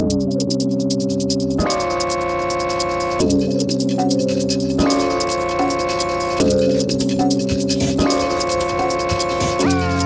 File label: Drum And Glitch